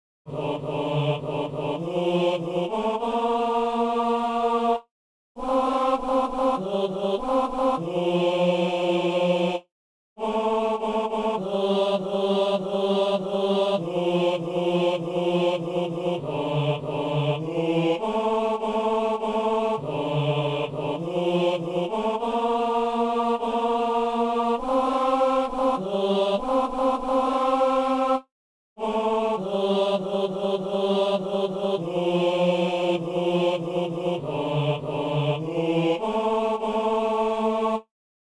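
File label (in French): ténor; Saint-Denis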